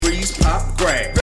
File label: freeze pop grab